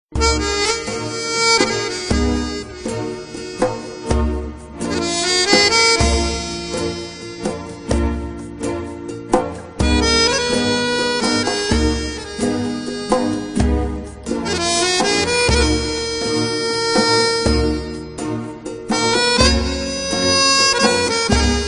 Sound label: Ringtone Mobile